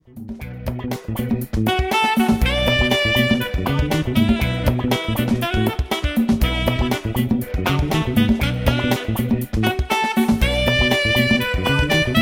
Funk Alto Marm Bird

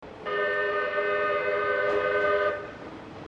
klaxon; nishitetsu3000

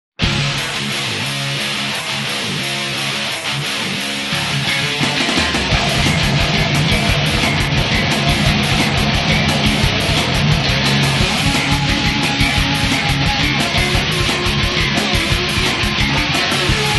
overdub

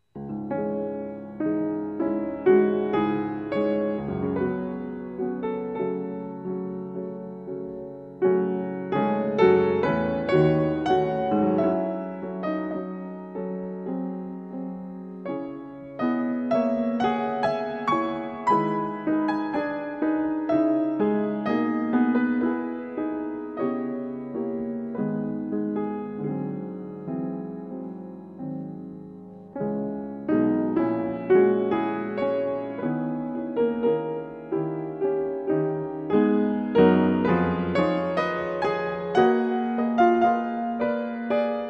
Skryabin Etude cis-moll

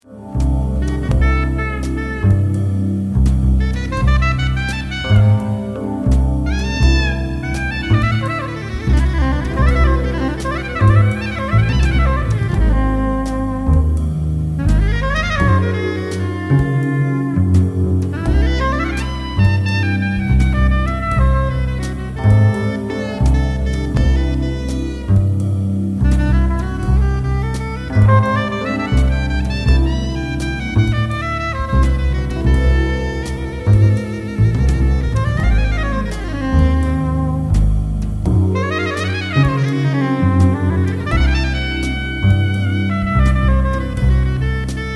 Song Sop; Susutake

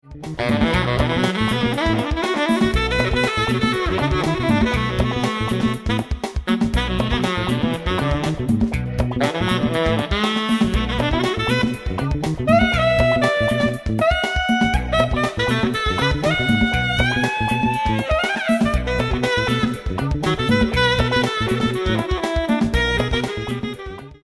Funk; Warburton

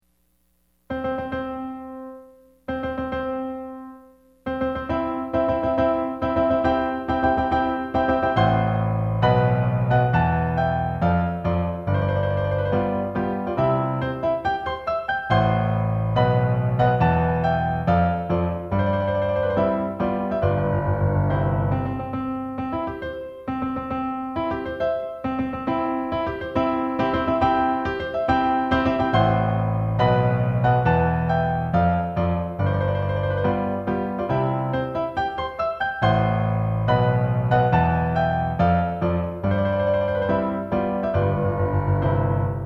Wedding March

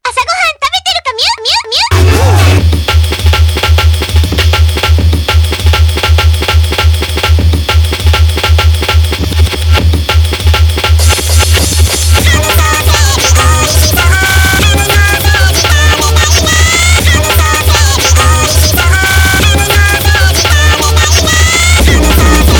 Hamlin bass

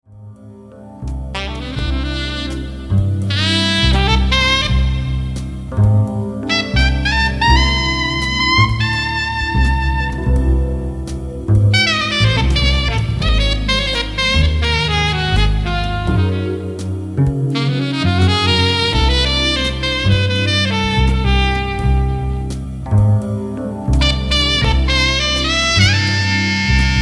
Song Alto; SAXZ eji8

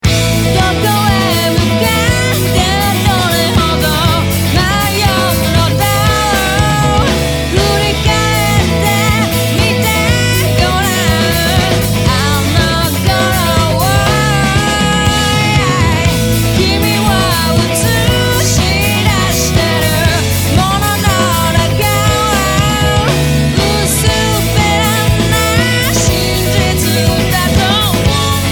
CHORD

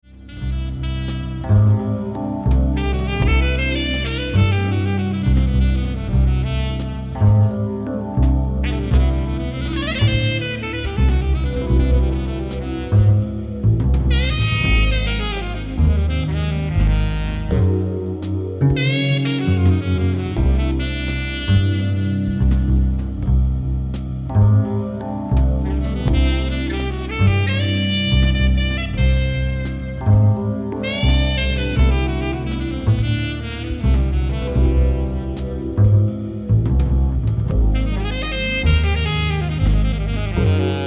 Song alto; Ponzol M2

